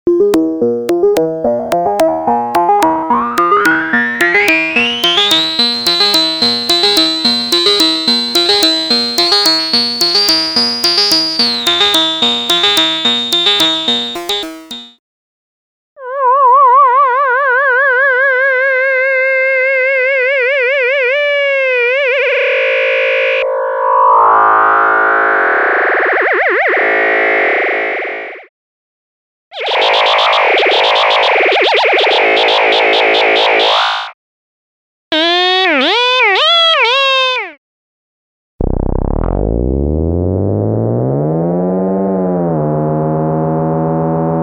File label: Moog Modular